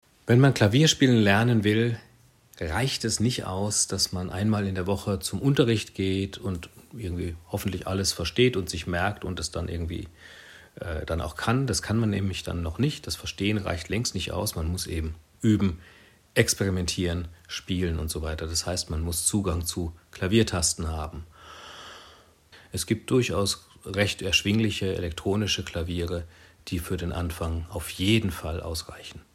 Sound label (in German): klavier